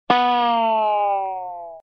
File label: twang; disney; mashup